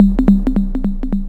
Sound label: Cool Glass; Jungle; Blu Mar Ten - Jungle Jungle - 1989 to 1999 Samplepack